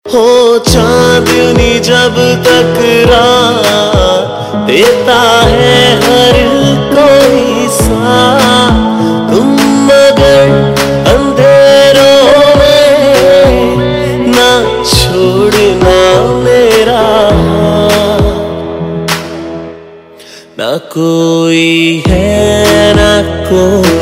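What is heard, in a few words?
Cover Ringtone, Punjabi Pop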